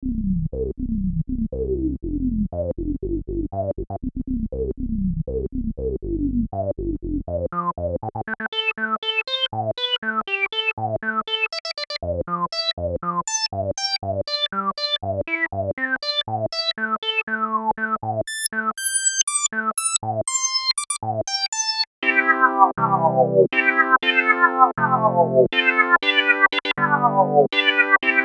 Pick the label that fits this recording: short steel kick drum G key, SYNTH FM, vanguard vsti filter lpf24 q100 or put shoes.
vanguard vsti filter lpf24 q100